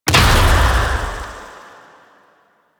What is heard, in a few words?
Scarab Swarm Burst; Warframe; Mummy